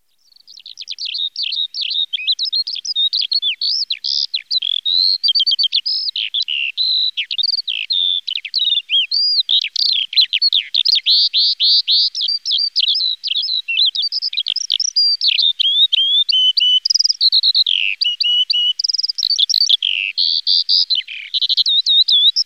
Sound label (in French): Alouette des champs